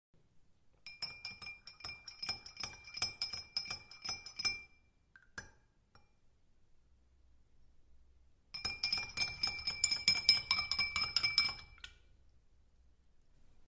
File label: Stirring with spoon